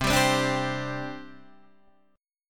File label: C Suspended 2nd, C, guitar chord